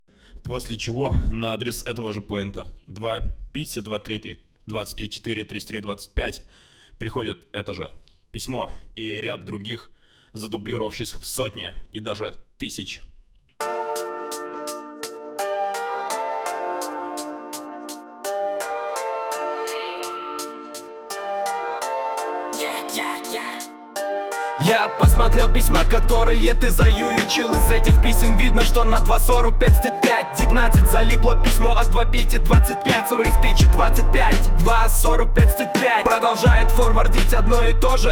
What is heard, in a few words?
rap